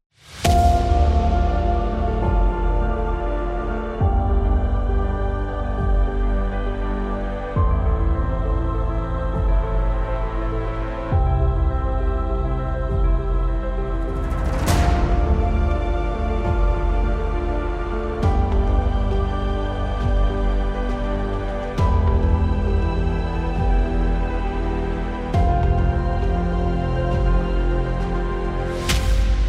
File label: Epic Trailer